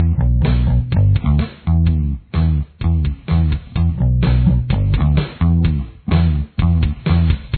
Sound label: bass